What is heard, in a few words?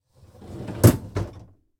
shelter storage close; Original TK Sounds